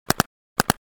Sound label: Paintball gun automatic burst firing shots; sport